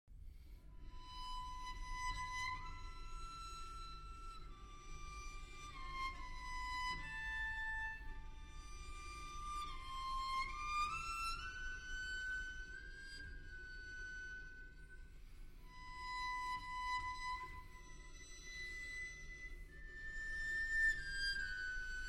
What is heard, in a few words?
Piano Trio Andante - Moderato - Poco più mosso